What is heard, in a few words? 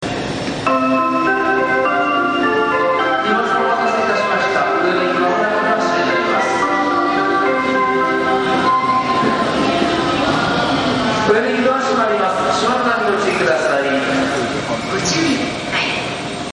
hassya; kitasenjyu